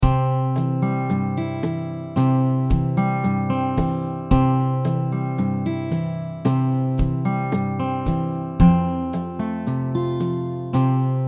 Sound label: No Melody, ukulele; Rainbow Ukulele